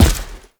Heavy Step Default, Footsteps; Enemies; Foley; Warframe; Tau Dax

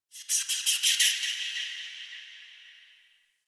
Ext Creature Distant High; Ambience; Misc Outdoor; Warframe; Infested Eris

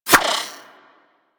Pet Skip Rope Whoosh; Warframe; Moa; Tenacious

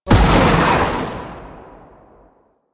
Embrace Raptura Release; Warframe; Broken Frame

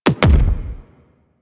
Weapon Punch Double

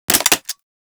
Vomit Gun Mech; Warframe; Weapons; Infested

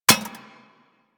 Bullet Impact Generic Metal; Surface

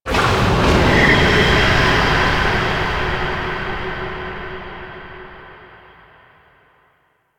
Mission Start Stinger; Venus Kahl; Warframe; Quests; Veilbreaker

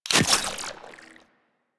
Glyph Consumable Activate; Warframe

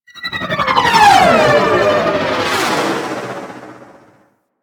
Fighter Ship Attack Maneuver, Engines; Warframe; DE; Vehicles; RailJack; RailJackEnemyShips; Grineer; EnginesGeneric; Maneuvers

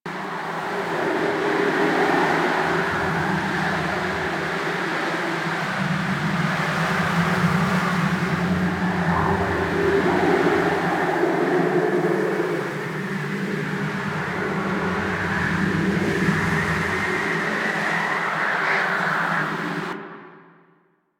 Settlement Crevice Amb, Interior

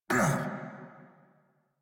Pain; Warframe; Eidolon Grineer

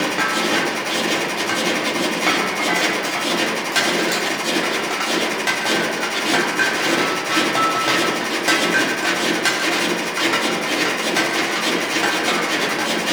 Metallic Machine Pulsing Loop; Ambience; Props; Warframe; Entrati

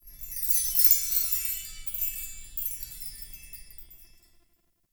Hut Interior Chimes; Midrath Island

